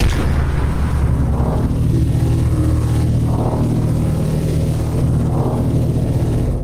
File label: energy explosion